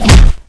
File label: melee hit wall